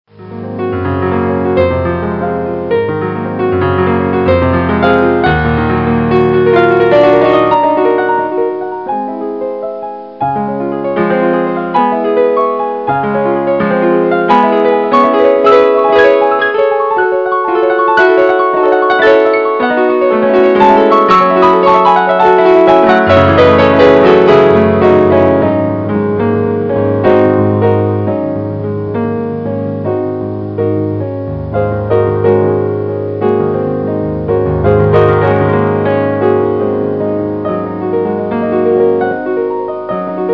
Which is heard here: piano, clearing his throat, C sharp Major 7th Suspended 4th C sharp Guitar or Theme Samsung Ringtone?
piano